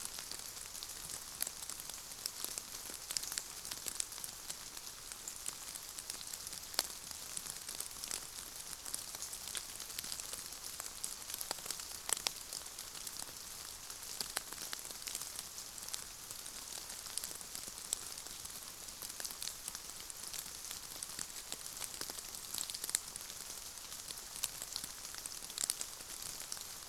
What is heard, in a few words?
Rain light leaf drips; boom library; Thunder And Rain